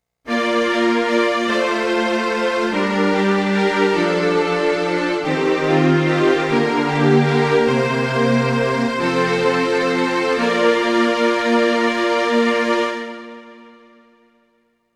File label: Korg EK50 Strings